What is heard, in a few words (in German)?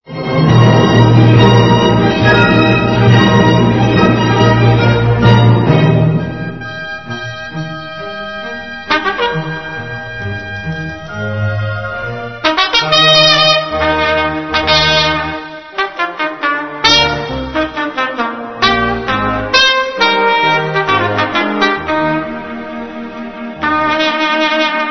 Solotrompeter verkackt Hummel Konzert